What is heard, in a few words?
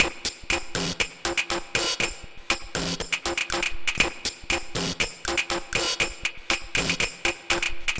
Drmz 120; Zero-G Kocktail Kollection; Adrennalinn 2fers